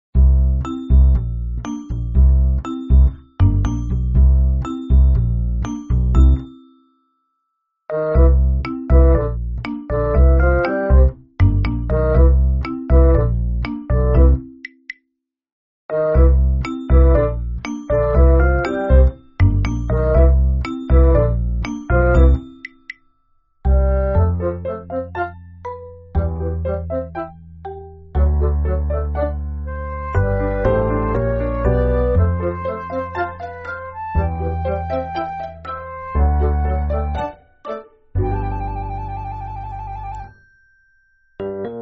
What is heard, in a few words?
music; dino